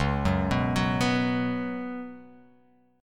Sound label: C sharp 7th Suspended 4th C sharp